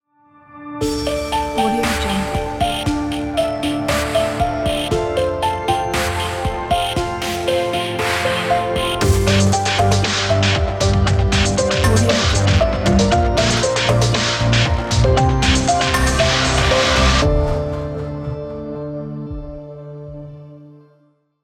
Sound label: modern distorted logo